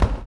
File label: STOMPS